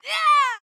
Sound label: Spin; Jackie